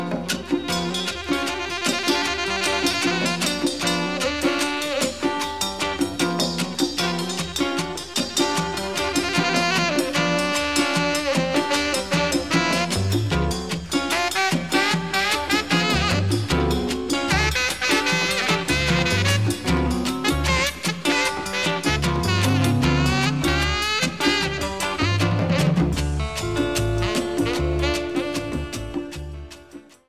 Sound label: sax